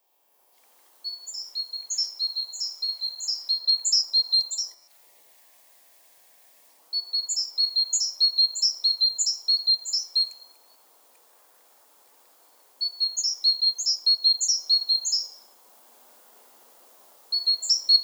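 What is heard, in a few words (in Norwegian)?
kjøttmeis parus major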